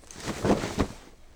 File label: drop item; Rust